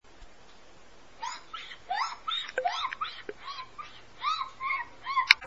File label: Wipe